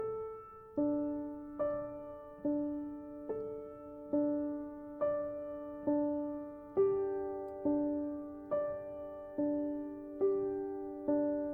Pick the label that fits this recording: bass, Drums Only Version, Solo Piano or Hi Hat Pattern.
Solo Piano